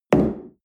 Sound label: espresso place tablecloth